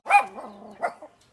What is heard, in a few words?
dog bark